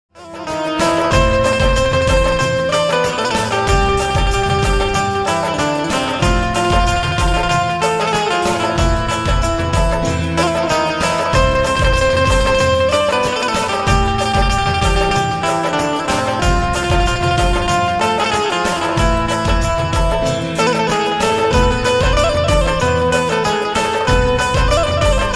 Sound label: deshbhakti special ringtone banjo cover song; lakhanbanjo